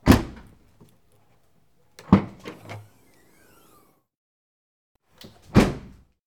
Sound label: Car Boot Close Open